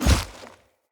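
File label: Heavy Grunt Boot Walk Vegetation, Footsteps; Enemy; Sinecure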